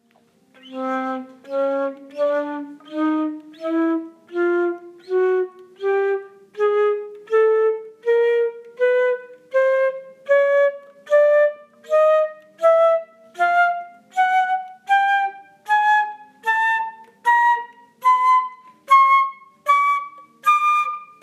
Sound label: flute chromatic staccato